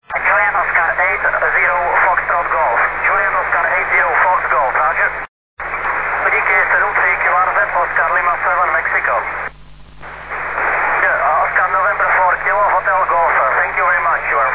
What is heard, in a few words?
VHF; OL7M; IARU